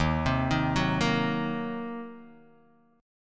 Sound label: Eb Minor 6th, Guitar Open D